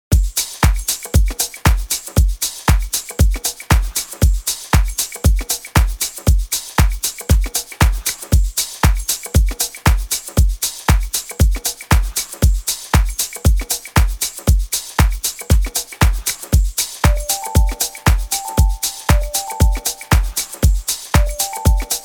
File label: Soundscape